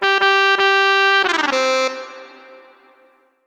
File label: gliss desired playback